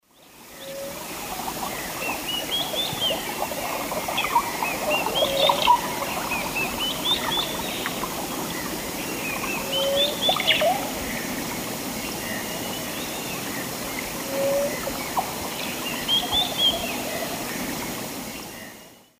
Myrmotherula multostriata, fast song, S. America Eastern Lowlands